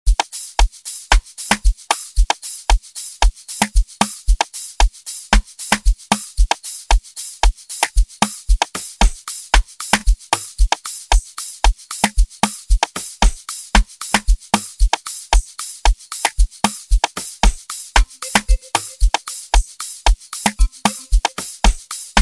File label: amapiano Type Beat